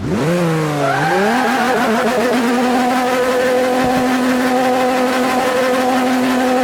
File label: rev, vehicles cars; lwcars